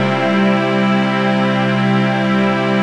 PAD, Dance Mega Synth

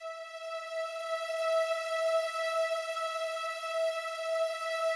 slow strings E4, Yamaha VP-1; Music Store; 90's Sample CDs

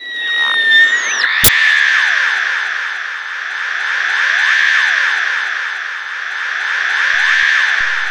AQUA MODEM, AQUATONES; Spectrasonic Distorted Reality 1

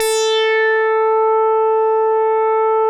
MINIMOOG

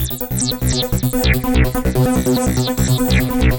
B1, SynthLine; NiceBeats

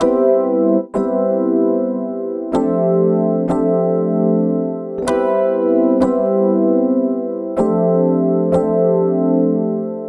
EP, Keyboards, Electric Pianos

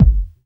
BD, Dance Mega Drums, BD HOU; Best Service

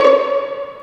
STR VLN PIZ, String Sections, Violins Pizz; Roland LCDP13

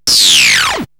FX; AMG Megabass REMIX! VOL-2

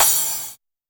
TR909 crash, Roland SH-32; Drumset 03

